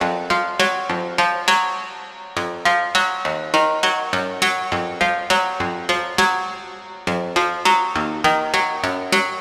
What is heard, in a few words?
Banjo, 102 Fmin; Big Fish Audio